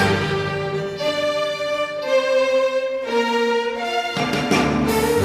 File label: strings, Sad